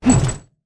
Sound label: Lantern Swing